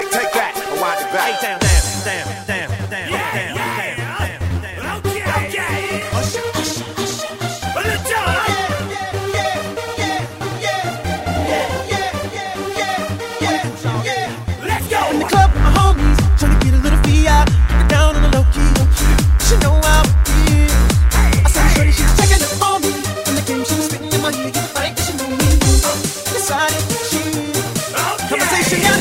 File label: Remix Yeah Techno